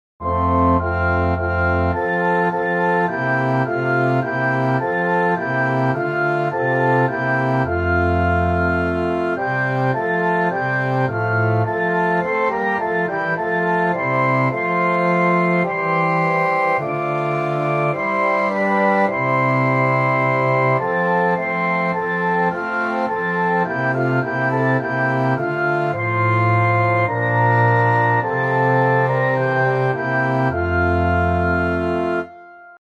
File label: Orchestra; Hymns